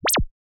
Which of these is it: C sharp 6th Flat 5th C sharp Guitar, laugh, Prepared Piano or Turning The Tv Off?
Turning The Tv Off